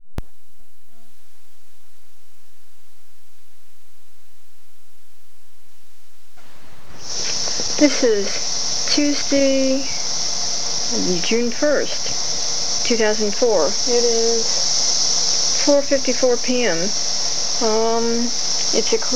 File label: cicadas